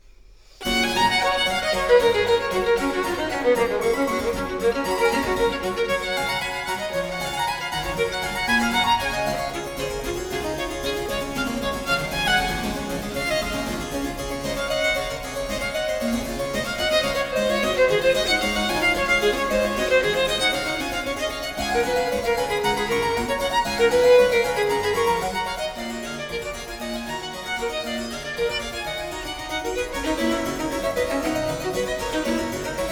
Sonata No. 6 in G Major, Allegro, Violin and Harpsichord; BWV 1019; Bach; J.S. Bach